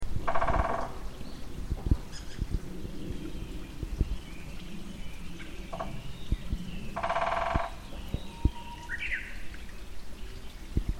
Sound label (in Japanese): 4月16日の朝、散歩の途中で聞こえてきたアカゲラ2羽のドラミングとウグイスの合唱です。背景は谷川ではなく水路の流れです。; アカゲラのドラミングとウグイスのさえずりです。